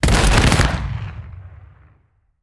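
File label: UAV volley, impact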